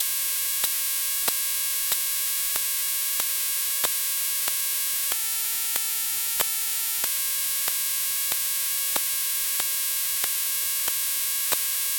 Wireless Landline Telephone, Unheard Sounds Electromagnetic Fields; ktwAudio